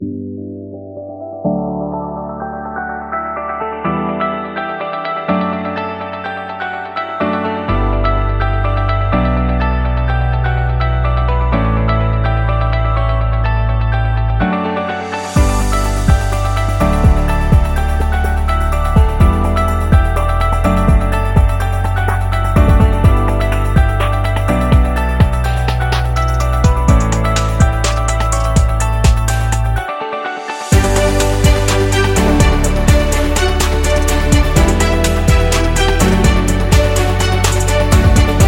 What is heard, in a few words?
Corporate Promo